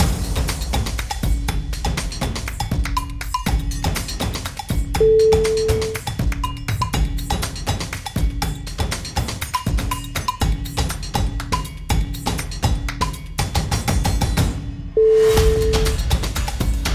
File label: mad percussion